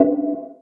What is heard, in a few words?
Target Enemy; Test Realm